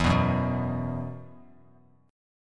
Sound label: E Suspended 2nd E, down, Bass Standard; Esus2 strummed